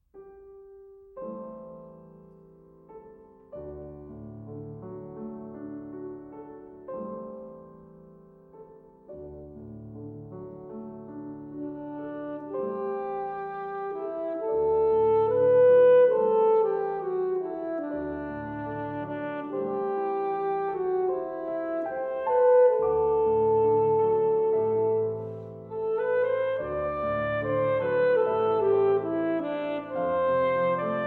English folk song

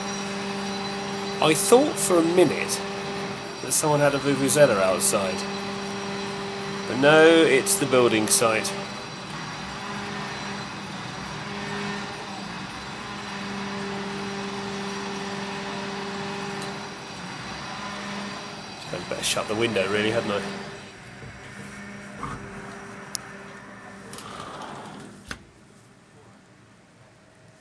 building site vuvuzela

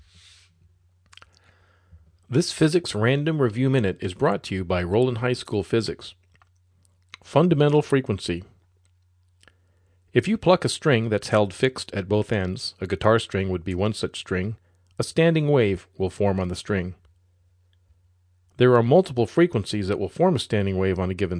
fundamental frequency

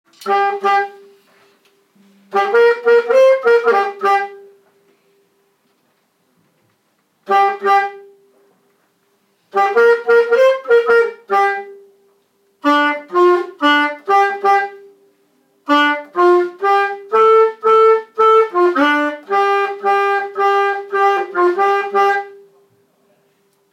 saxophone solo

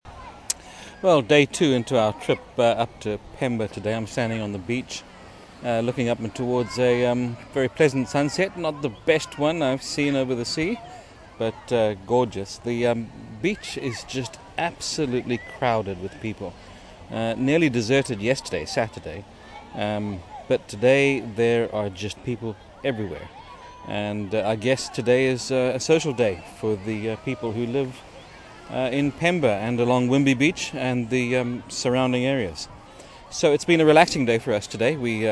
wimbe beach mozambique

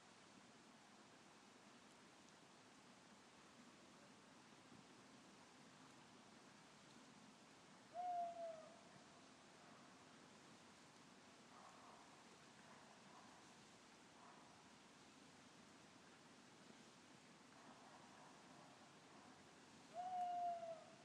owl

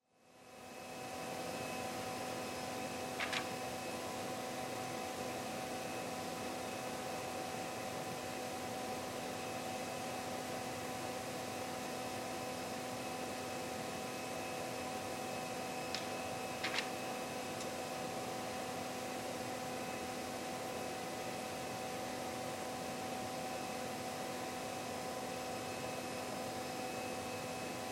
sticks lifting wallpaper

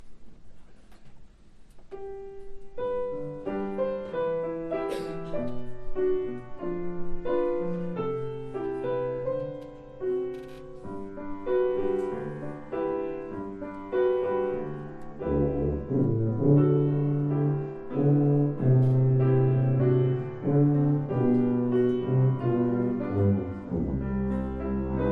tuba

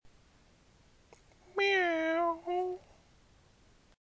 miaow

